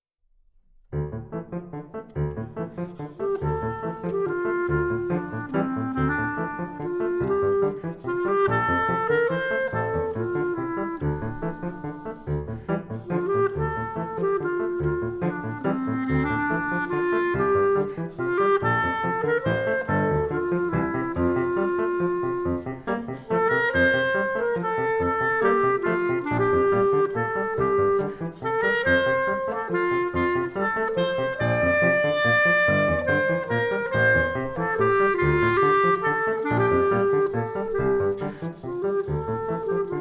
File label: Skilful solo; Skilful Solos